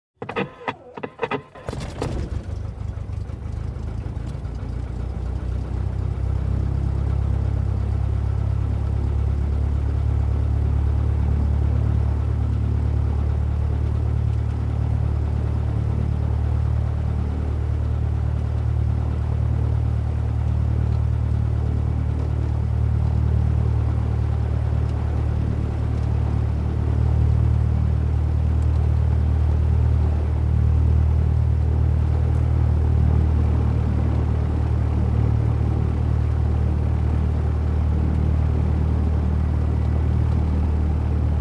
Light aircraft on hangars engine start

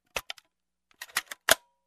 Camera Film Reload

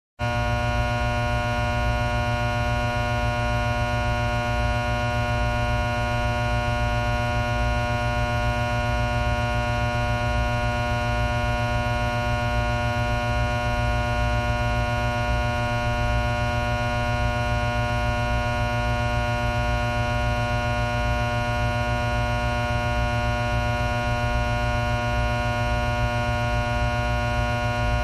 High voltage buzz

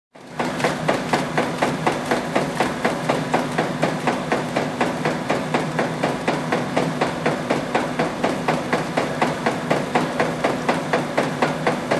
Labeling Machine Running

